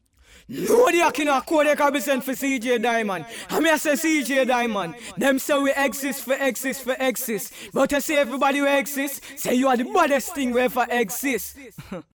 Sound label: JINGLE